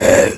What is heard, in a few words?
death satyr small; dota; creep deaths